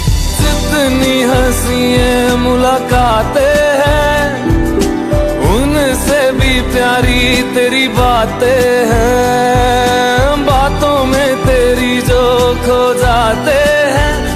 New Romantic Hindi Song Ringtone

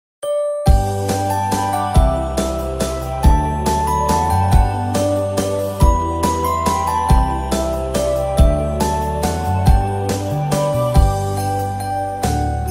We Wish You A Merry Christmas Ringtone

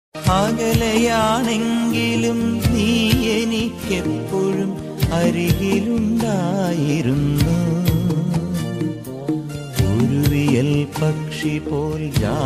Malayalam Romantic Song Ringtone

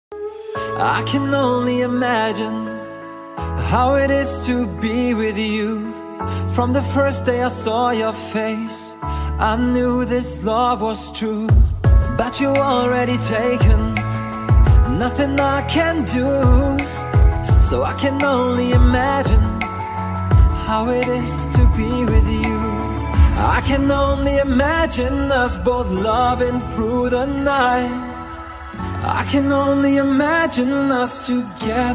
Relaxing English Music Song Ringtone; ringtones

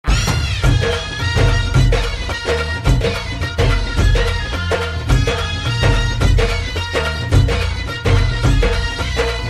Movie Bgm Ringtone; ringtones

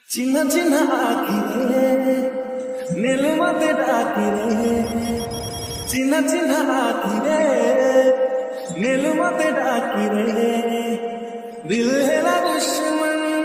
Odia Song Ringtone

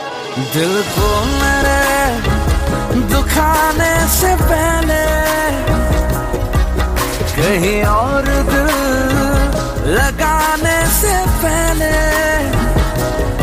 Sad Song

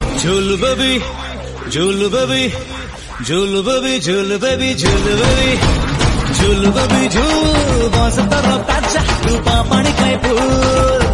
Odia Song Ringtone

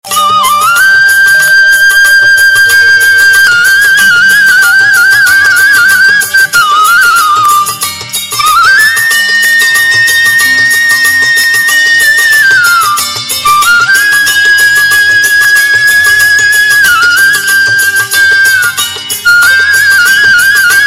Shiva Flute Ringtone; ringtones

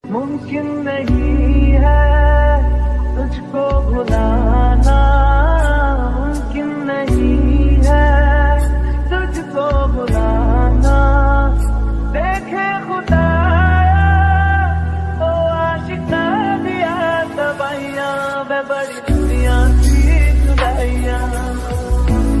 Sad Ringtone

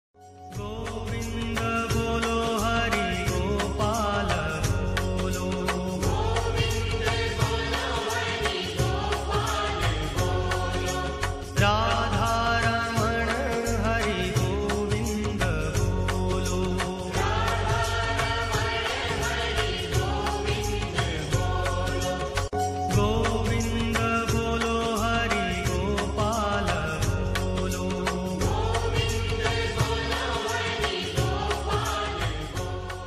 Govind Bolo Hari Gopal Bolo Ringtone

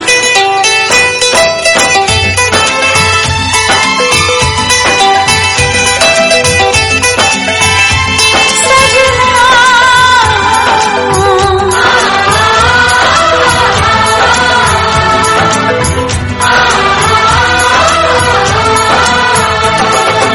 High Volume Music Ringtone; ringtones

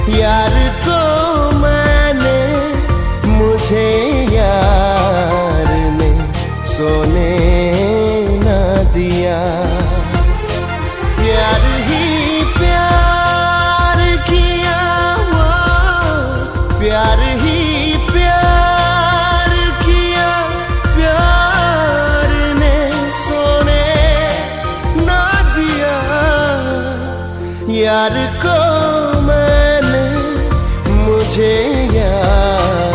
Romantic Old Hindi Song Ringtone

